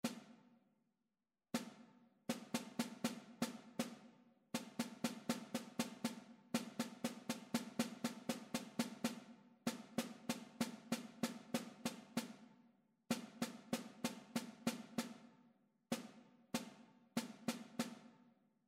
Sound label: metric modulations; ear training